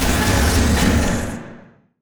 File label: turret reload; weapons; Quake 4 Alpha; id software